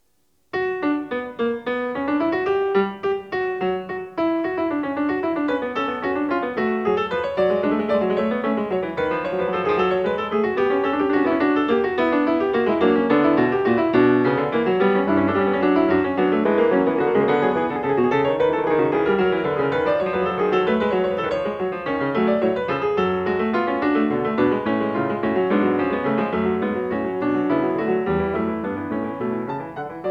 Fugue No. 24 in B major, Bach, Well-Tempered Clavier; BWV893